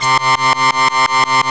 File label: Gear Junkie LFO, Bass, Effects; Ableton; Creative Extensions